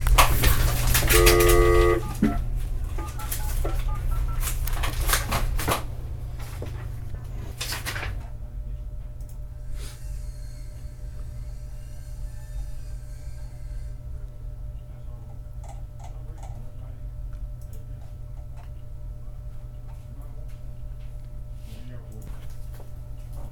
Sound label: Small office ambiance